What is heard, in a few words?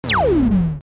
player laser